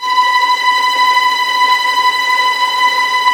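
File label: strings, Vlns Tremolo; Roland L-CD702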